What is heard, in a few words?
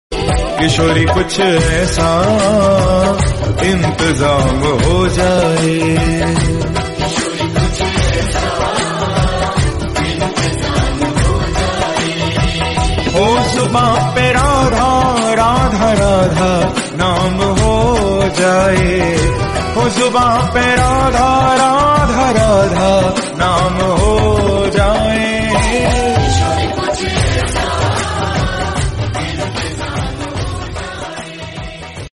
bhakti hindi